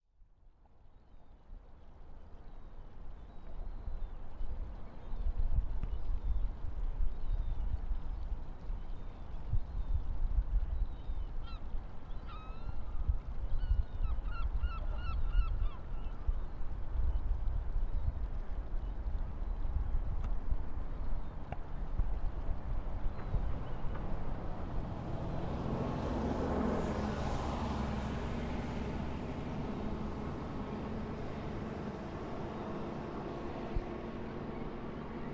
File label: tyne south bank below redheugh bridge seabirds road noise from above bus passes